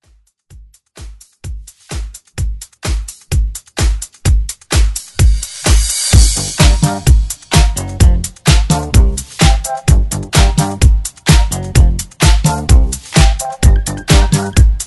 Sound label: 80s Funk Redrum